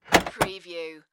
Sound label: Auto Trunk Open; sound effects bible